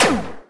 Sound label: shoot, bad cat; enemies; EscapeFromCatalunya